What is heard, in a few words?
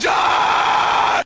scream metal band guy; ooh ahh